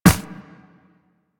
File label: Run Ice, Footsteps; Warframe; Operator